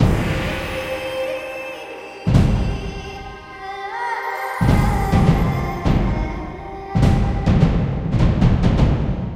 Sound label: freespin intro loop; parthenon